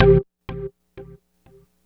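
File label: Tech Organ Hit, Keyboards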